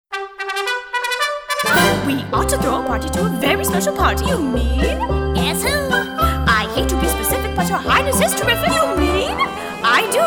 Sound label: Reprise Guided Vocal